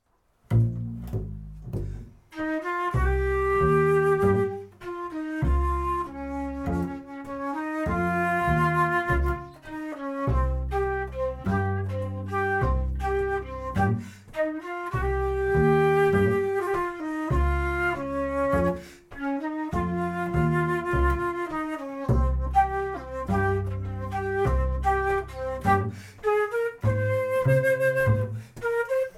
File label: flute and bass